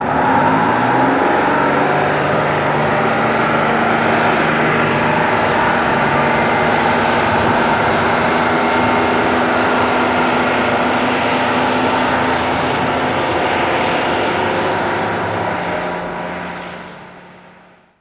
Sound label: City Bus Rev Pull Away